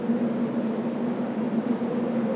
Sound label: wind external